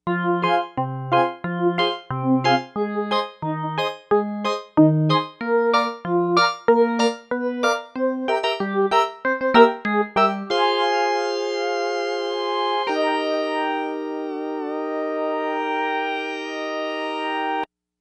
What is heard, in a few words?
Splash, Novation UltraNova; Factory Patches